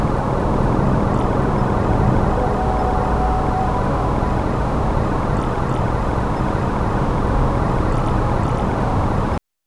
exterior suburban city

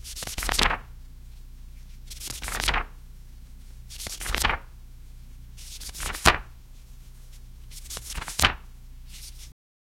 paper book flip, book

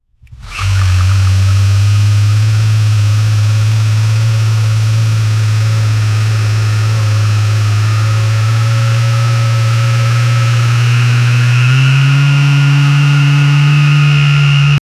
mclaren with twint turbo, sports cars

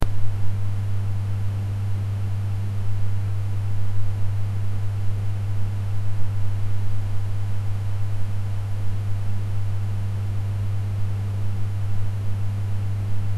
a funeral in a basement, interior

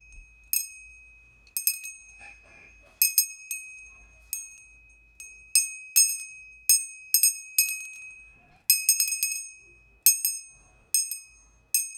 jingle, brazil; constituio repblic

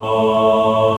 a dark choir

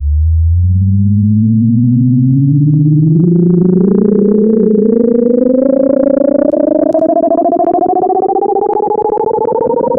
short rising, haptic

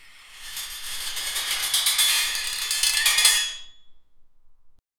steel bar slowly bending, deformation

